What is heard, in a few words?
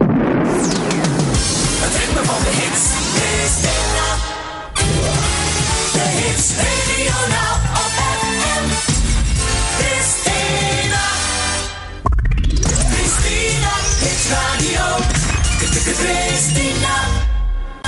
Jingles; Christina